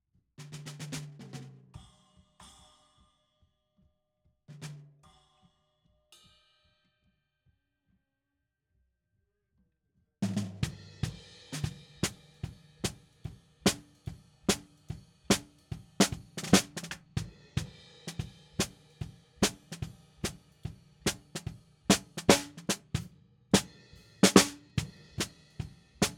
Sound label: SNR BTM